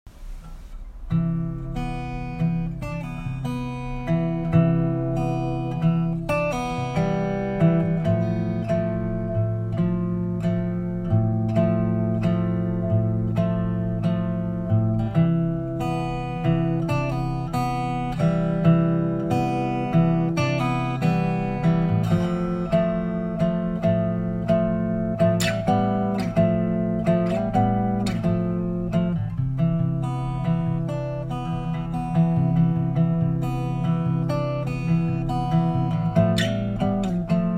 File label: acoustic